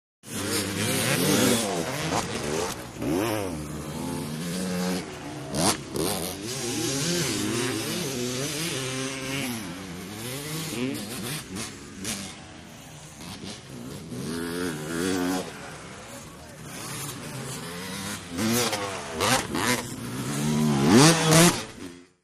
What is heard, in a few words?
Motorcycle Motocross; By 80 Cc ( Several ), Cu Fast Bys L / R, Shot Cu.